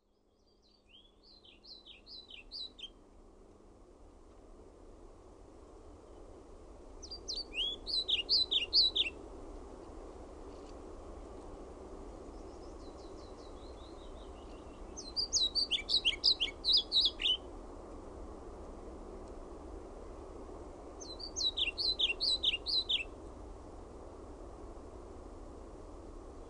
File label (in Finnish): KIRJOSIEPPO, Linnut; OMAT AANITYKSET